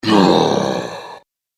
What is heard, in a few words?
Ogre Growl; Monster Sound Effects; horror sound effects